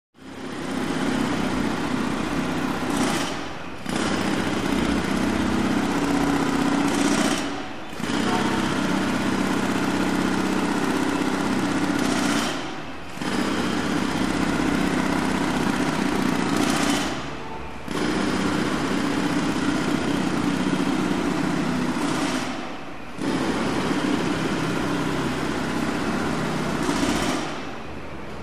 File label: Jack Hammer Medium Pe, construction large